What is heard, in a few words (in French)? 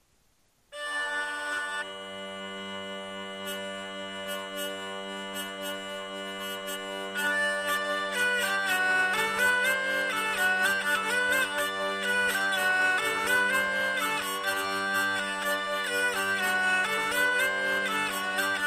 bourrée 3 t; La Châtre